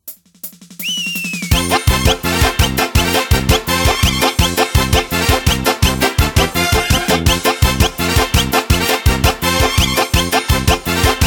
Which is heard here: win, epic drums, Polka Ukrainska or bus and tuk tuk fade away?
Polka Ukrainska